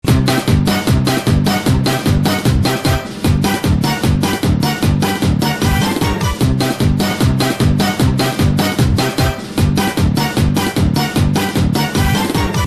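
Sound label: background music; macaron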